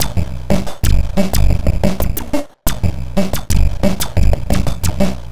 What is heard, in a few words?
both noisey 090bpm; Zero-G; Downbeat Dance Grooves